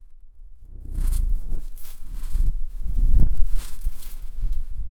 rustling grass as the cat

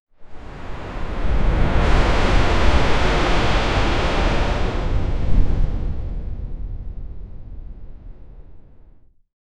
realistic high speed fall, air rush

